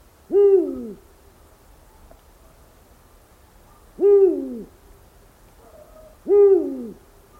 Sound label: eurasian eagle owl call